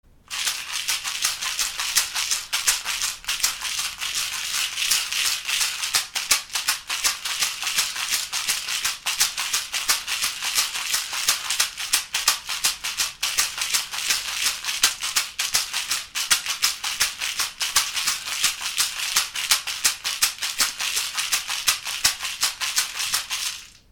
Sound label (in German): rassel, schamanische rasseln